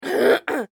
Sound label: Human Female Cough Clear Throat; Clearing Throat